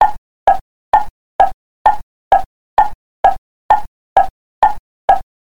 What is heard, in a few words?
loop 130bpm